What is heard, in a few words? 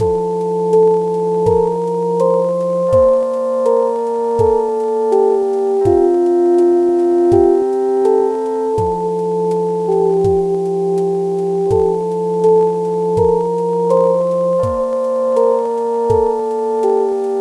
lofi joy